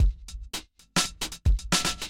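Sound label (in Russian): барабанный луп закрытие тарелки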